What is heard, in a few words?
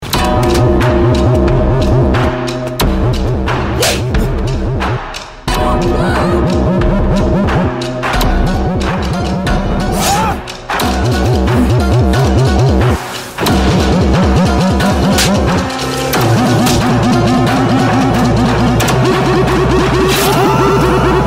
BGM; RX 100; Telugu